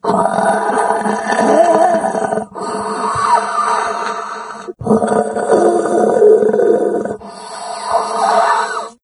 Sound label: rebreather, hazmat zombie; doom3